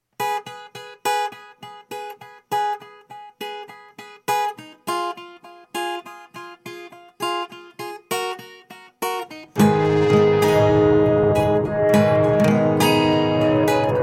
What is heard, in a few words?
Through The Dusty Milkyway nodrums